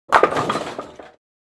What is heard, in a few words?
Bowling strike pins